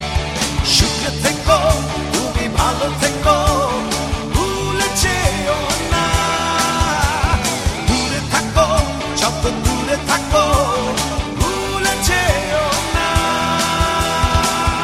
Bengali rock band ringtone

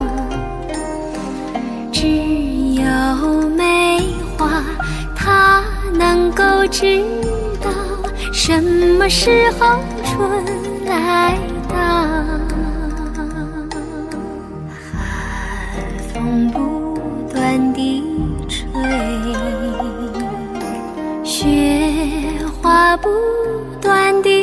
beautiful chinese traditional ringtone

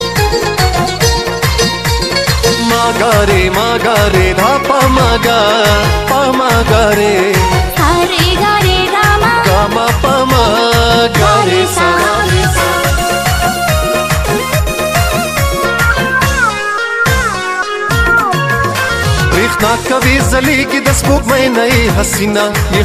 pushto, ringtone

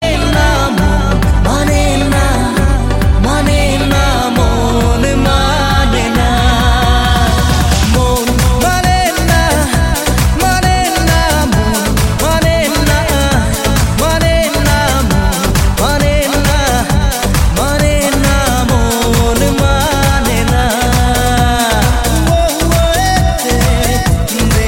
Bengali romantic ringtone

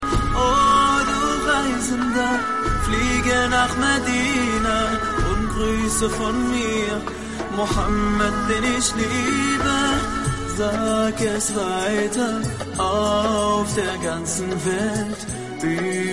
german nasheed

